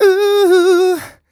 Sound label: 128 uh e-flat, mal, Vocals